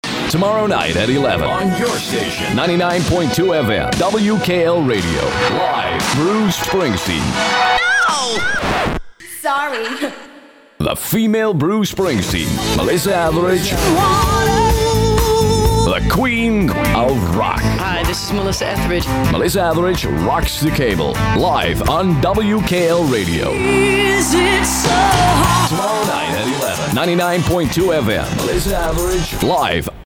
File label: jingle